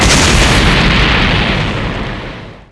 explosion; DBZ

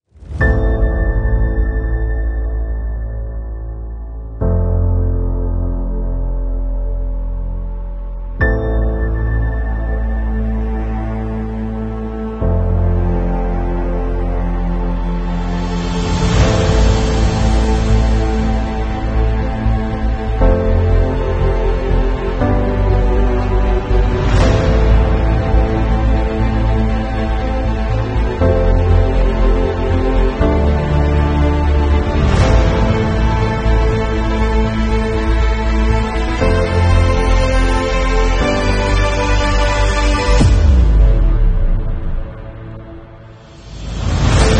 Epic Powerful Trailer; AdobeStock

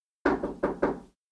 knock sound

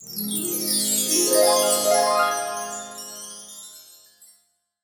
Magic Wind Chimes Harp; Blastwave FX

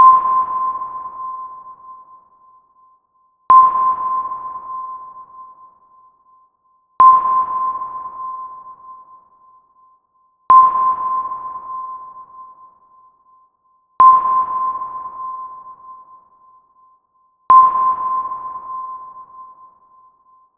BOAT Sub Sonar

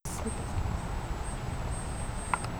Artifact 8476 hz

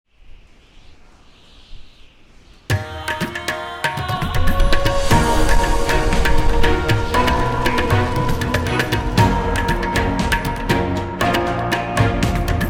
BEAUTY MAKEUP COMMERCIAL SPOT; FILM

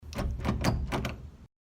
locked door sound effect

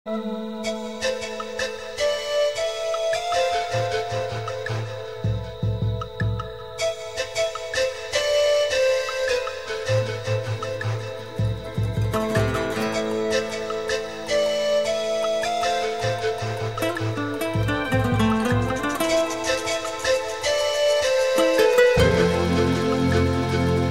Flute

